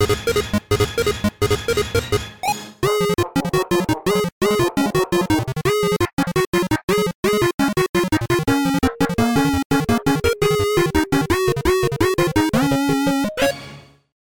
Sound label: Trailer music; DOGTARUNE